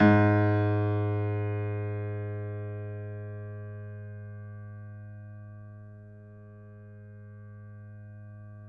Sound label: piano; East West; The Ultimate Piano Collection